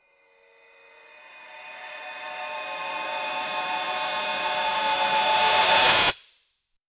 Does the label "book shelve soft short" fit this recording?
No